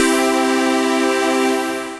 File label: Sparked Pad, Synth Pad; Ableton; Factory Packs; Build and Drop